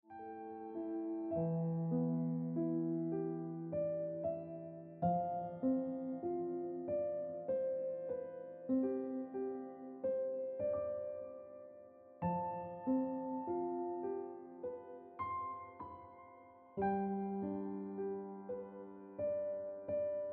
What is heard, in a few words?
Ringtone